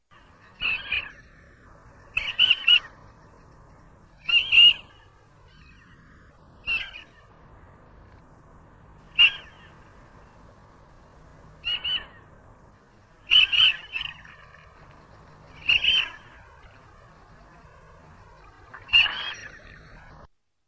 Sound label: marbled teal